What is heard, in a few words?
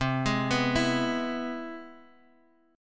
C Augmented Major 7th, Guitar Open D, chord